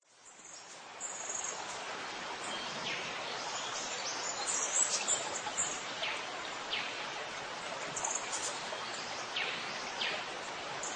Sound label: australian creeks and waterfalls, nature sounds of nature